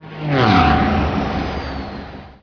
pod wizby

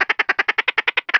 vox pdroid

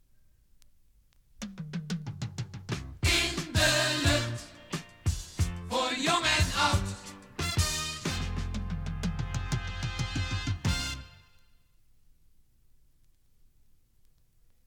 topformat maxi jingle single

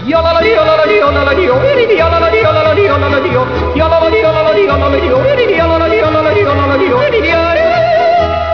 YODEL, Traditioneel

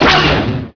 weapon gun; tusken

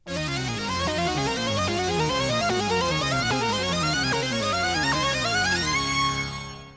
topformat maxi jingle single

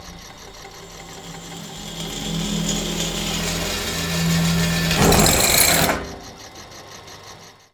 DRILL; AKAI; 1000 Sound Effects

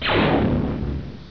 BLAST, Gunshots; sound effect

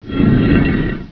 STONE; sound effect

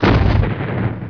impact mellow

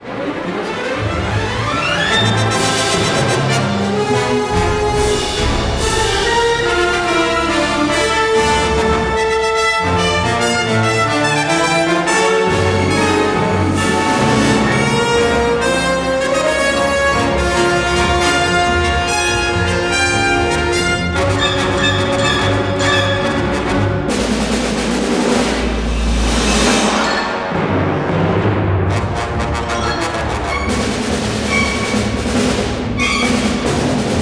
droid loop

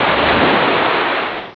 WATER HIS

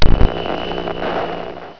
STATIC, Electricity; sound effect

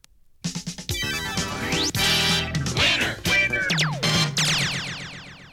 topformat maxi jingle single

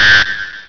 BEEP

